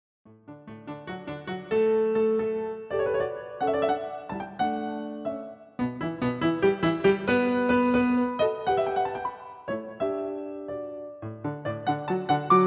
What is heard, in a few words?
Piano Sonata; No 17 in D K576 First Movement Allegro; Mozart